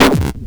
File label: grind, EMU XL-7